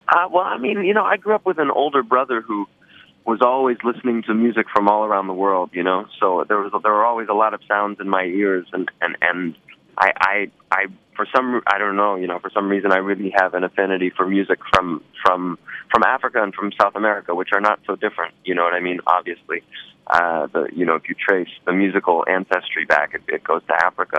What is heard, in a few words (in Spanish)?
musica latina